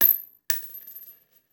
Bullet Shell; Sound Ideas; Guns

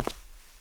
concrete; blindside

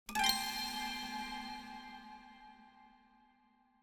String Pluck Chord Strike With Reverb; Hollywood Edge; sound designer's toolkit v2

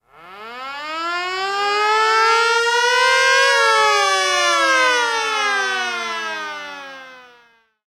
Hockey Goal Dallas; Hockey Horns & Buzzers

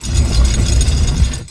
Door Large Stone Open; Doors

